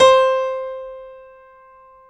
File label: PIA GRDPI, PIA_GRAND, Roland; Sound & Vision; Gigapack I CD 2; PIA Grand 5